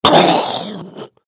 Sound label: feral ghoul death; npc